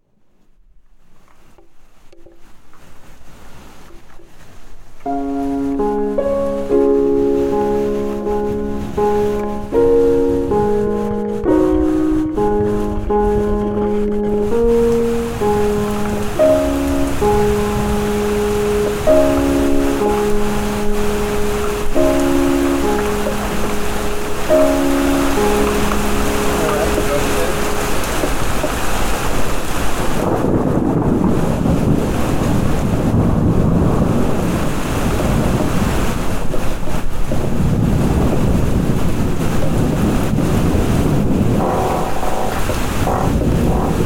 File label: techno thunderstorm